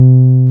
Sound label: TB303, TB-303 BASS